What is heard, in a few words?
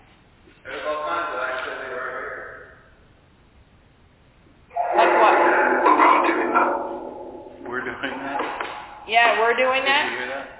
Phasma; Second Floor; martinsburg roundhouse investigation